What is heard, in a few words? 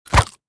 death simple